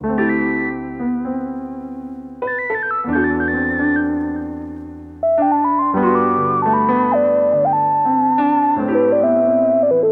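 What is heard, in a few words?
150 loop acoustic piano synth lead E minor; songstarter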